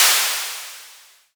Hats, Elektron MachineDrum; SPS1 MKII

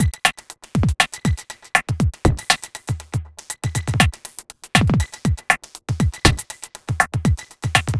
Hi-Hat Esoterica, Beats

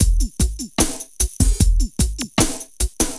LOOP, Eurotech; Sounds Good

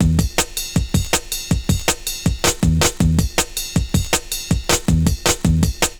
Ride Step 160, Drum&Bass Breaks; EZ Rollers

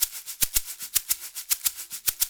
Soukous; African Contemporary; Spectrasonics; Supreme Beats

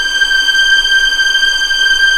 G6 LEG P, VIOLINS; East West; The Ultimate String Collection

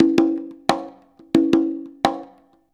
CONGA, Modern Percussion Loops; USB Soundscan vol.56 - Modern Percussion Loops; AKAI

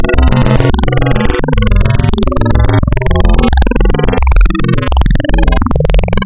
Spectral, wavetables; Architecture 32

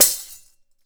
DAF HIT; WorldSounds

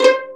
PIZZ VIOLI; E-MU Formula 4000 Series Vol. 5; Protozoa; Proteus2 Instrmt

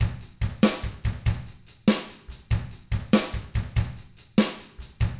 ROCK 6/8, Drums; ILIO; Double Platinum Drums 1

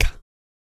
REGGAE, Vocal; ILIO; Vocal Planet; Blues & Soul

